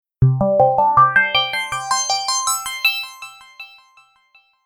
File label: train melody